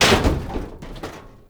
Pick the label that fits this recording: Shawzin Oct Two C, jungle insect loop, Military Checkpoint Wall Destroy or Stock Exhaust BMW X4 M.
Military Checkpoint Wall Destroy